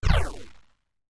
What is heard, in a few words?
SPACESHIP FLYBY